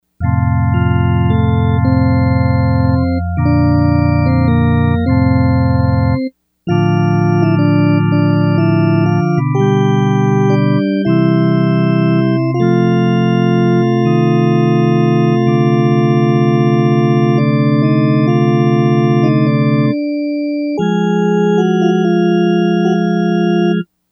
Gentle Pipe, Yamaha DX100; Factory Patches

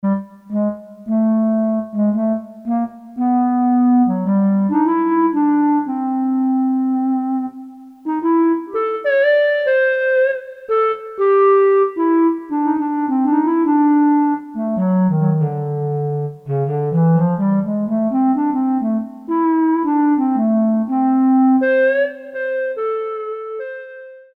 Clar., Reeds, Korg DSS-1

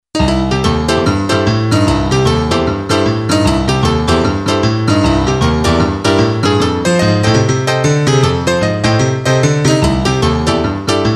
Piano High; Korg MSC-05S Piano

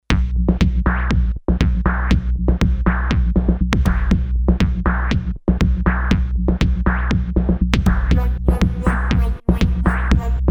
Tremors, M-Audio Venom

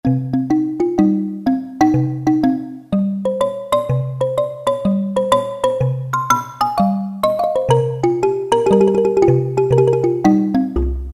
African Kalimba, Roland PN-D50-04

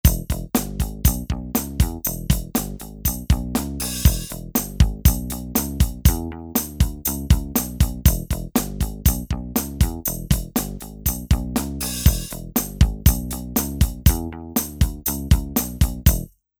Rock

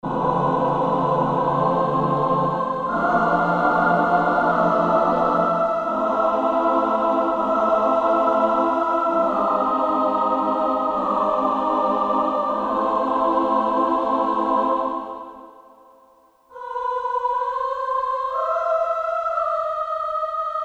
Choir, Voices, Korg DSS-1; Voices II

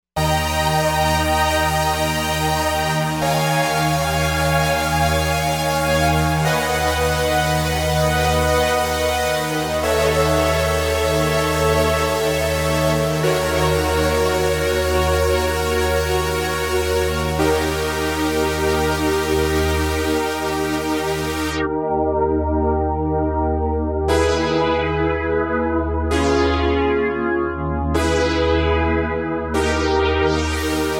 Hybrid Saws

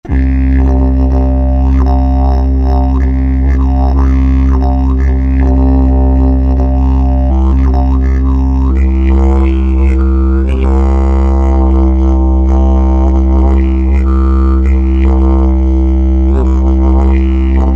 Roland V-Synth; WheresDaLoop; Factory Patches

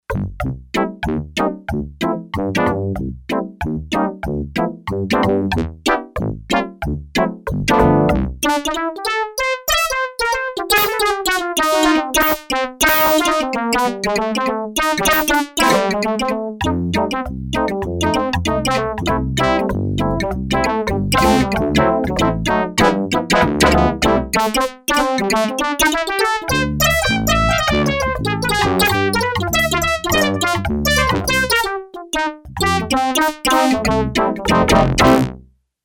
Mallet Horn, Yamaha TX802; Factory Patches; Voices

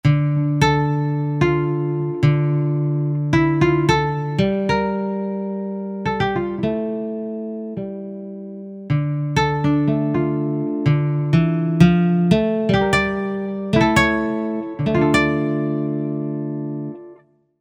Nylon Gtr

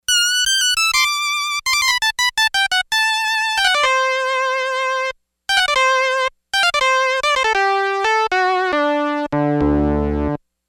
Synth Solo 2

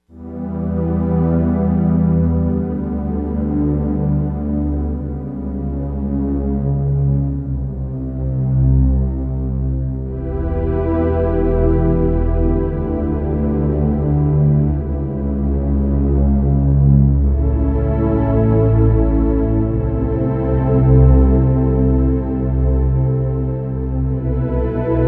Pad Nine

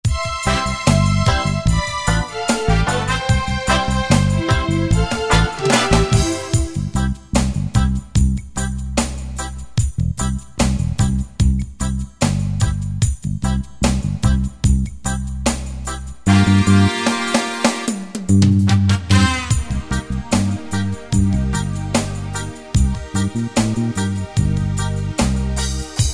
Reggae, Yamaha QY70; Preset Styles

